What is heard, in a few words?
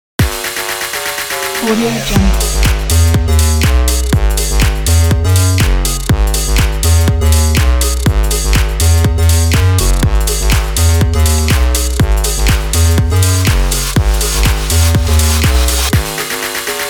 deep house logo